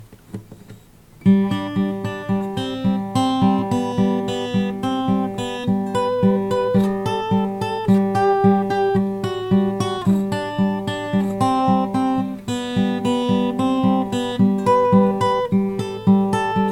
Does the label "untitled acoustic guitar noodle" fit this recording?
Yes